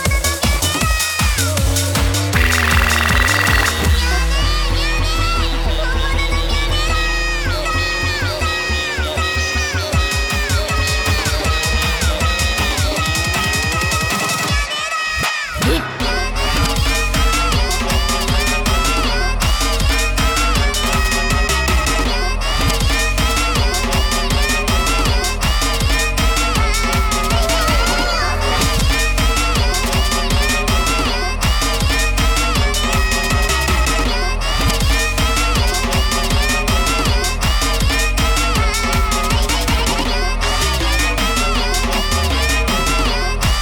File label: HardBass